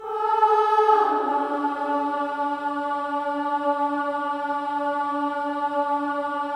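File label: Voices, INTERVALS, 5TH DOWN; Spectrasonics; Symphony of Voices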